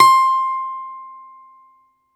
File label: BALALAIKA, Prima Balalaika, Plucked Strings